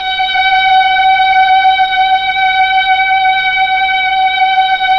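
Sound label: Mellotron Strings G5, Slow Strings; Keyboards of The 60's and 70's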